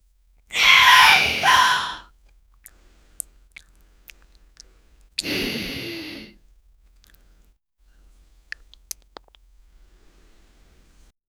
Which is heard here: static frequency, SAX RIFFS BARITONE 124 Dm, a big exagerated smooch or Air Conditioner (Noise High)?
a big exagerated smooch